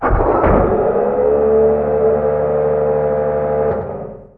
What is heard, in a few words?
Gears; Big bang